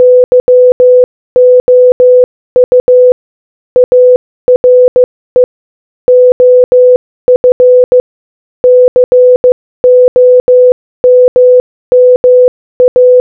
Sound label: Morse Code Audio Speed 15 15